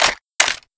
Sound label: MSG90 clip in; czero opforce